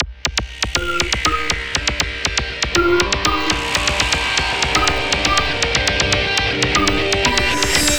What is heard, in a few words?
Music